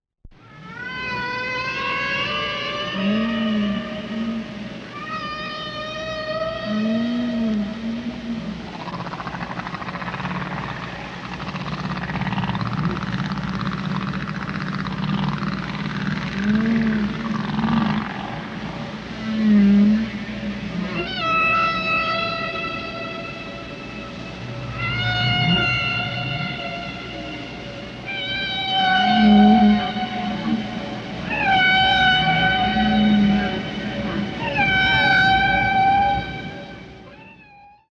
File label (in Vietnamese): am thanh cua ca voi duoi bien sau